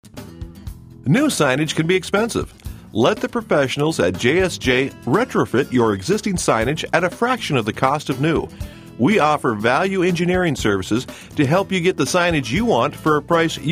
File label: On Hold; JSJ Electrical